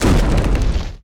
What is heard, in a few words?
island move; Animation Throwdown